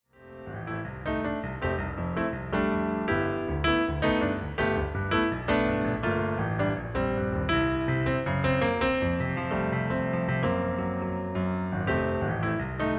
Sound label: PIANO SOLO